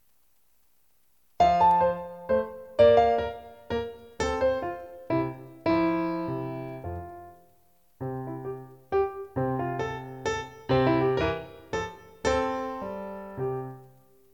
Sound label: menuet mozart